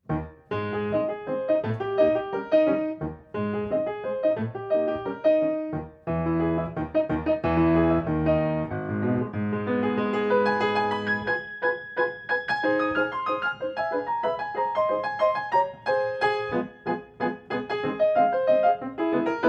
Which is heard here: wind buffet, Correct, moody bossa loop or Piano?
Piano